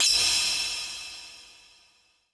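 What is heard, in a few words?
metal; KINZOKU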